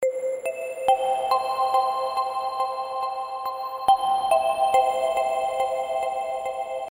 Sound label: Bell